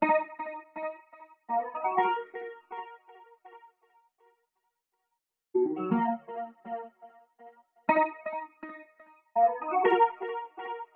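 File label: Guitar Loops G minor 122 BPM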